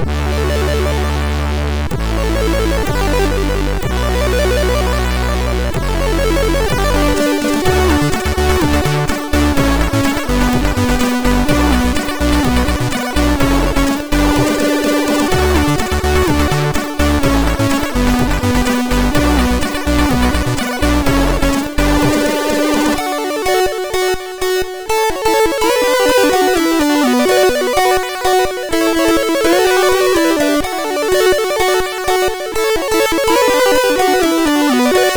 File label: sid, MOS6581; MOS6581R3